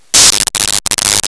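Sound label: electric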